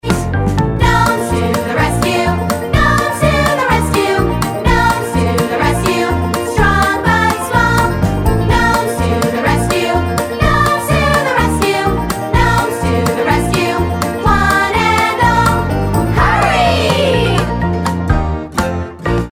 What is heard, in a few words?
Vocal